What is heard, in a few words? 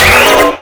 gremlin only hit